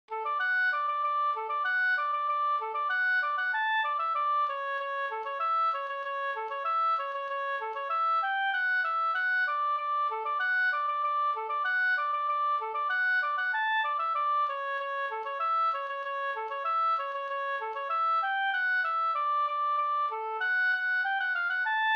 snoa Jamtsnoa D G dur

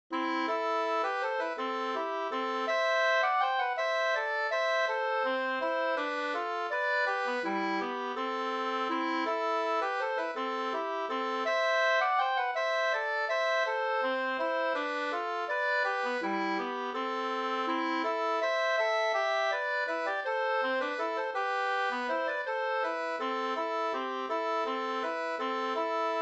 langdans; fr Vastra Torsas Da 2st

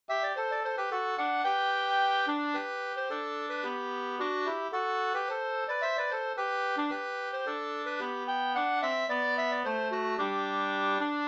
kadrilj; fr Oxie harad SvL 2st

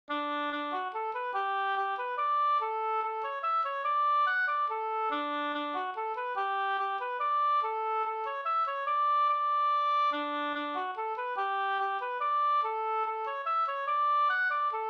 mazurka; Knappmazurka Bl

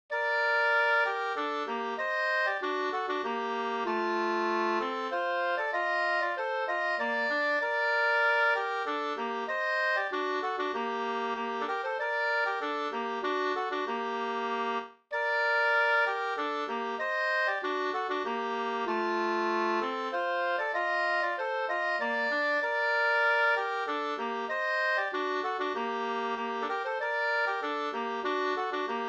polska Kyrkpolska

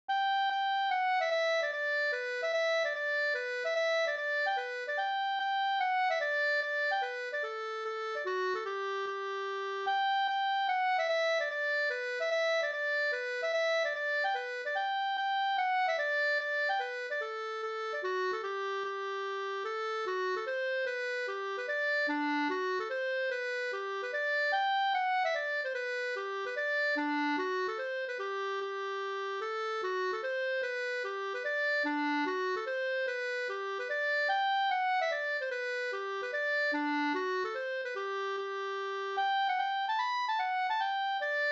gubbstot

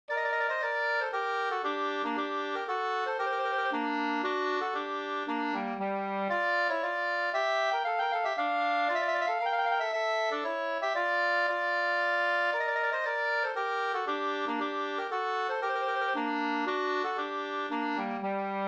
polska Gammal Alftapolska

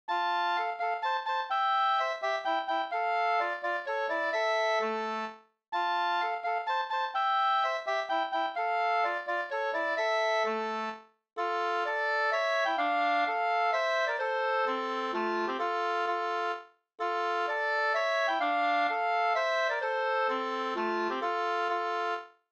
polska

